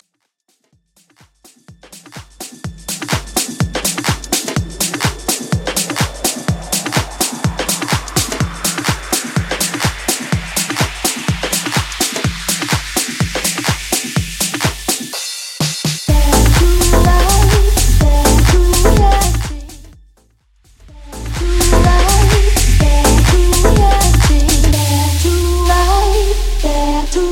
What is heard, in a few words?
90s House Club Mix